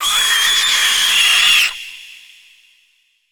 Spider Trap Emerge; Evolve; Gorgon

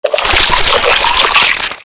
gibber

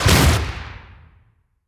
Melee Impact Base; Grounder; Evolve; Kraken

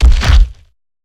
Climb Base; Evolve; Behemoth

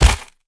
Collisions Slow; Lurker; Evolve; Gorgon

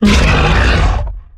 Exertion Heavy; Evolve; Behemoth

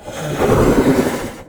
Grounder Sniff, Kraken; Evolve

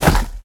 Tongue Grab Pull Back; Evolve; Behemoth

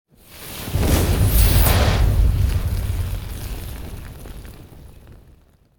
FireBall; Evolve; Meteor Goliath

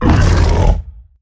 Stealth Attk; Evolve; Behemoth

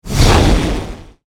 Rock Throw FLAME; Evolve; Meteor Goliath

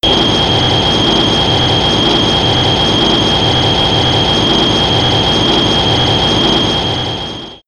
Heat Ray; Shin Godzilla

